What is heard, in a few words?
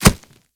Kubrow Step Dirt; Warframe